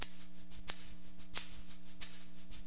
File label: SHAKER, Perc